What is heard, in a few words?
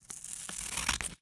Cutting Paper with Scissors; Office Supplies